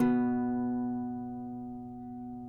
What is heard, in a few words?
cuatro; Spectrasonics; Hans Zimmer Guitars VOL-2